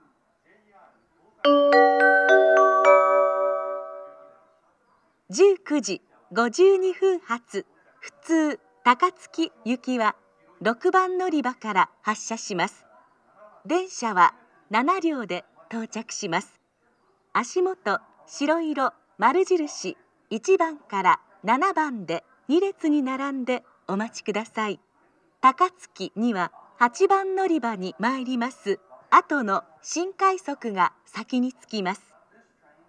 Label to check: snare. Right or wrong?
Wrong